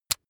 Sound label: razor rickety fold out hard